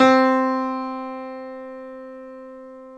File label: 9FT GRAND; OMI - Universe of Sounds